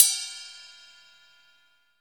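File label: Ride A, Percussion Hits; Zero-G Jungle Warfare 1, 2 & 3; 90 s Sample CDs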